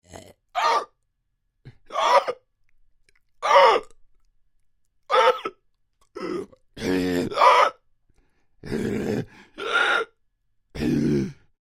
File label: Creature Troll Gasps Grunts Pain; Monsters; Horror; BigRoomSound